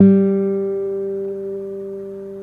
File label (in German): gitarre g3